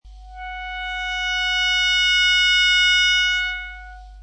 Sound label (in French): sol, clarinet